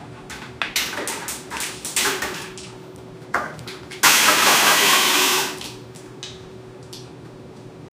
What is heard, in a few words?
the two mans pouring epox, room